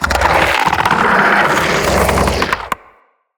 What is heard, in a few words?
Spider Vocal Chatter; Vocals; Root Spider Mother